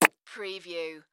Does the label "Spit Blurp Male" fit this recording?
Yes